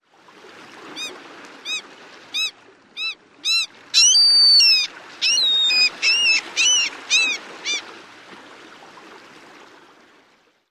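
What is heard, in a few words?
squealing outburst, Mew Gull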